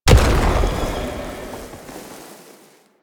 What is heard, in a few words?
Octopede Unearth Proj By Explosion; Warframe; Man In The Wall